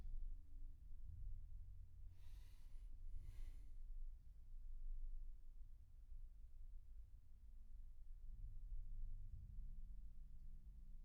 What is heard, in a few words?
Room A